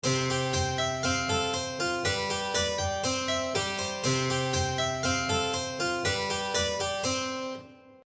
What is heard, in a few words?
Seamless Music Loop Harpsichord